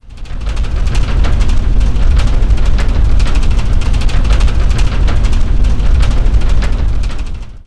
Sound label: rattle roll; fgdata